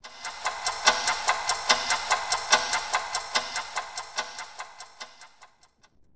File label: Time Over; Blinx